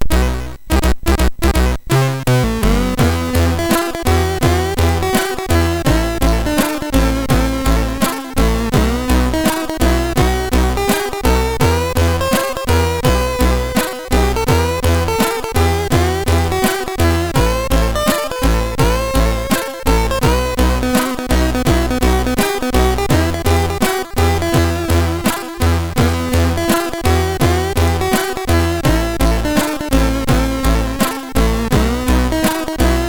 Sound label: Tango MOS6581R3; sid